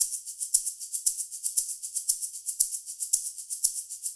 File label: Disco Shakers, 70s Analog Funk; Sonic Foundry (Sony Creative Software); What it Is! 70s Analog Funk